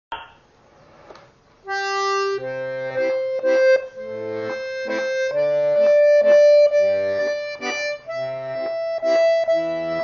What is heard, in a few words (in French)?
le temps des cerises accordeon diatonique